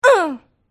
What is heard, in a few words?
mage female wound